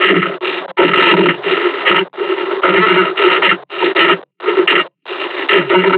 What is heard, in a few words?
panting, combine charger; Half Life Alyx